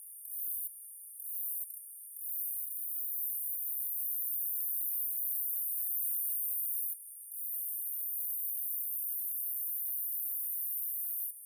Cicadettana calliope, cicadas, filtered; US; NC

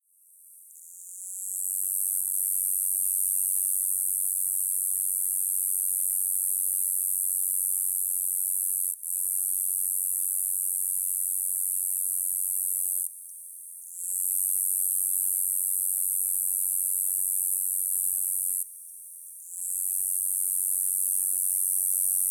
Diceroprocta aurantiaca, cicadas songs; US; filtered

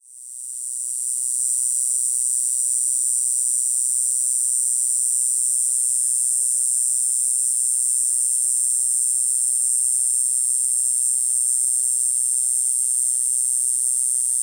Okanagana viridis fragment, cicadas songs; US; MS; filtered

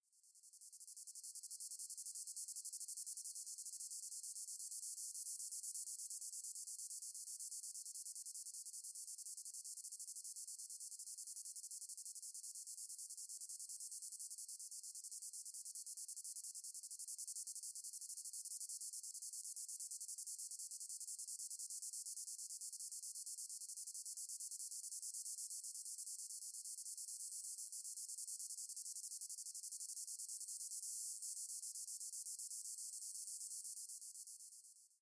Beameria wheeleri, cicadas, filtered; US

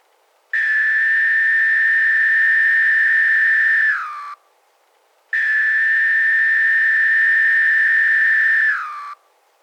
Magicicada neotredecim, cicadas songs; US; IL; clean

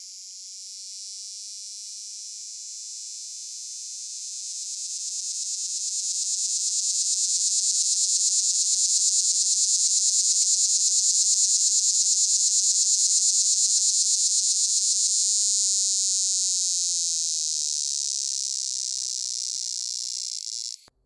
Neotibicen linnei, cicadas songs; US

